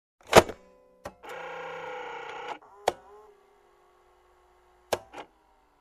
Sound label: Navigating a Camera Projector